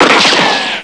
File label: pistol